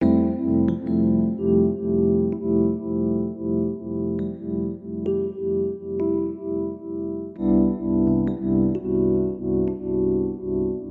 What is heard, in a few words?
sleepy